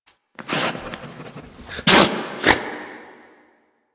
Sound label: sneeze, Kubrow; Enemies; Warframe; Tame